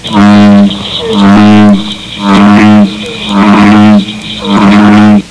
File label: bullfrog